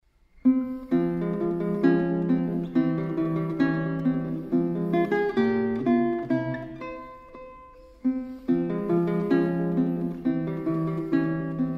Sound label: guitar; Andantino In F Minor Ms102; Paganini